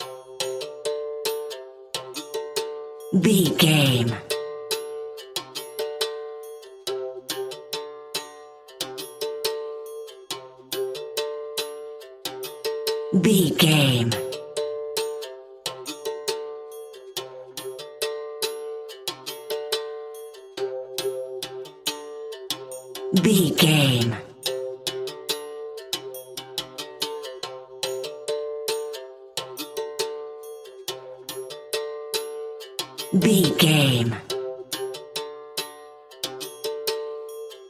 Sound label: tropical luxury drums only